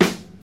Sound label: warm acoustic snare sample F sharp key, Royality free snare single hit tuned to the F# note